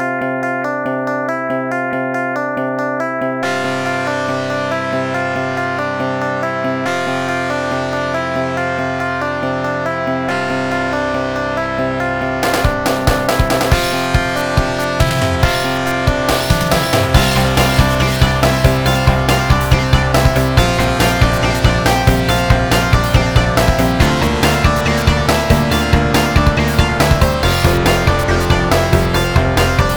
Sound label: music ducks be calm; Duck Game